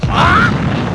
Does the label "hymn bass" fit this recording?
No